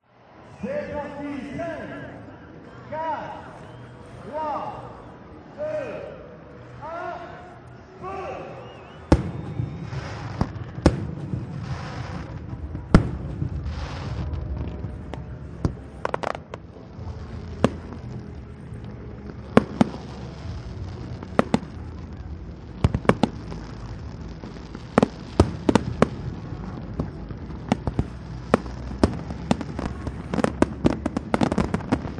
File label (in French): feu artifice binaural